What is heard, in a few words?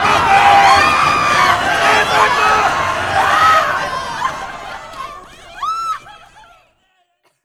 airport crowd terminal end